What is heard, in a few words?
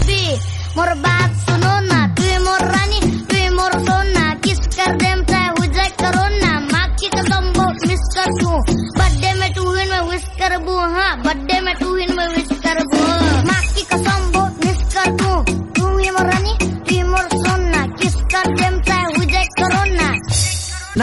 remix, punjabi